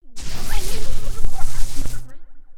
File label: shocked, emotes